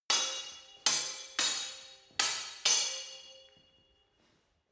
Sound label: sword hit fast sword freestyle